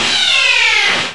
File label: Distorted Synth 4